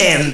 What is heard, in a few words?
Vocal Oneshots; Vengeance Samples; Vocals